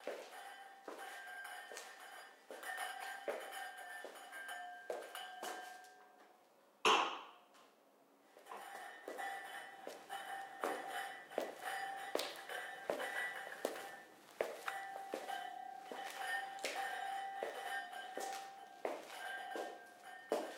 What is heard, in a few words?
Waiter Glasses